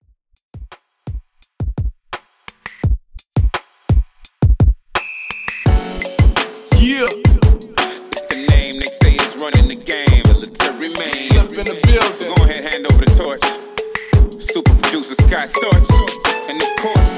85 Bpm

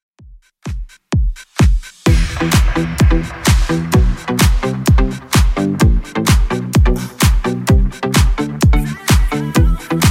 2ks Redrum 128 bpm

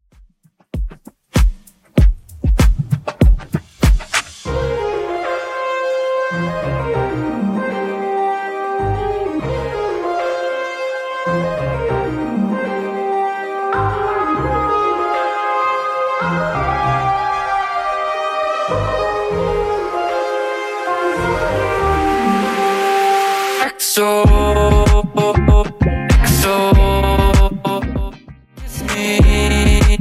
Top40 97bpm; Xtend Edit

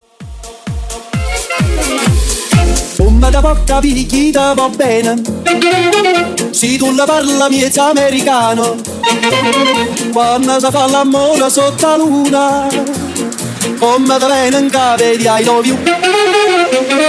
Tribal Dutch 129 BPM

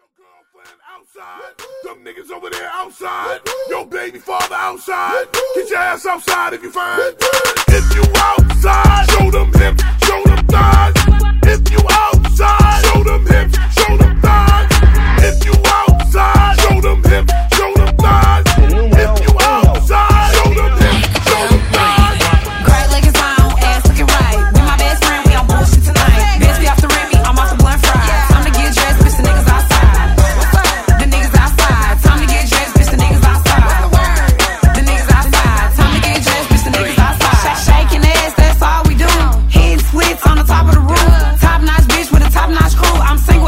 Clap A Pella Intro

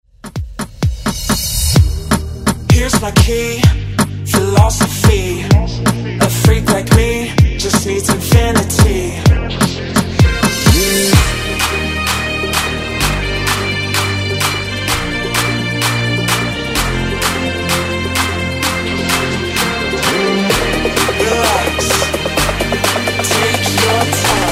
Afrobeat Redrum

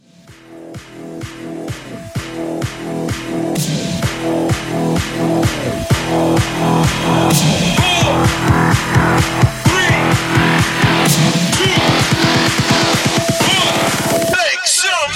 Epic Festival

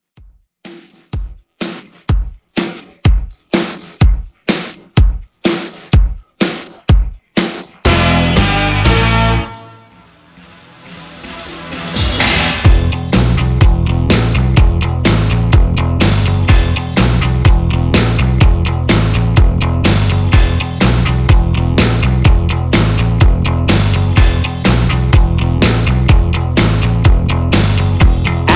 Re drum 125 Bpm

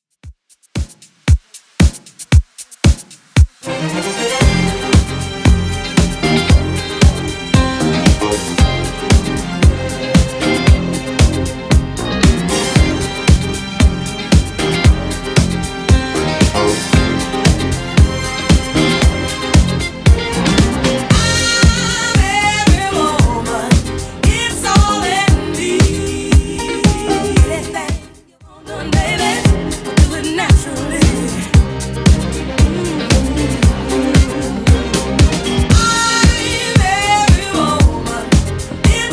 70s Redrum 115bpm

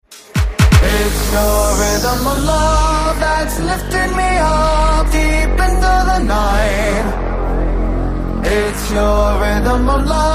Dance; Redrum

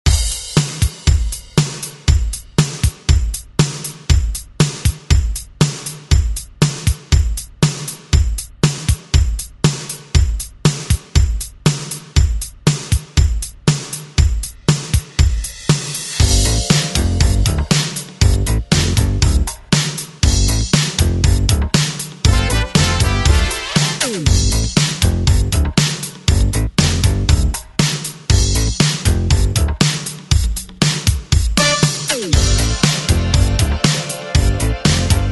80s ReDrum; DJ Mhark

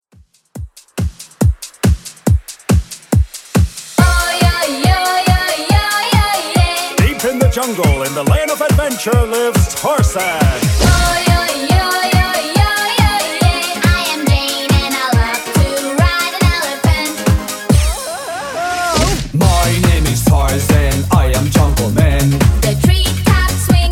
90s Redrum 140bpm

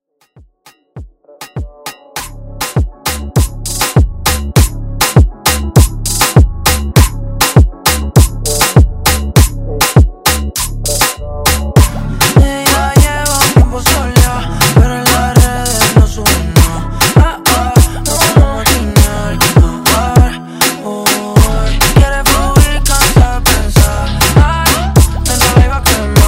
Reggaeton Mixshow Edit

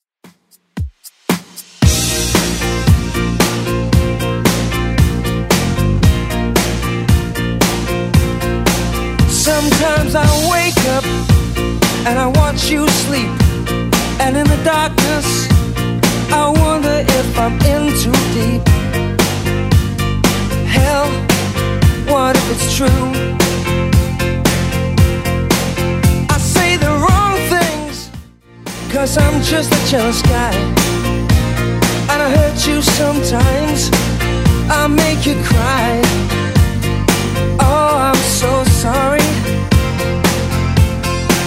80s Redrum 114bpm

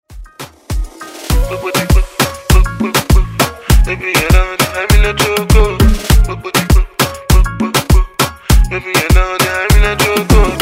Moombah Afro Redrum

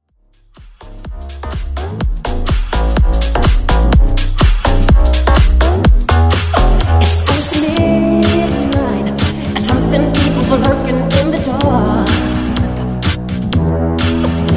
House Edit

